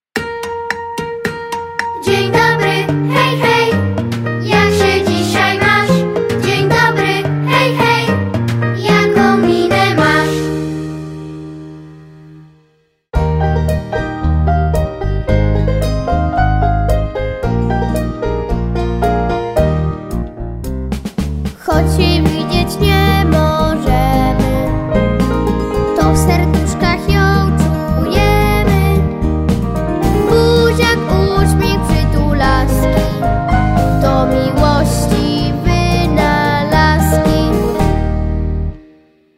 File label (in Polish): plas wokal; szesciolatki; multiprzewodnik